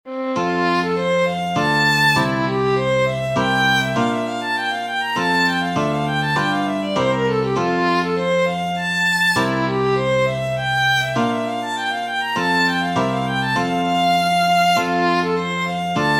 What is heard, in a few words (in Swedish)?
Polska F-dur; Fridolf Andersson; eft Carl Lignell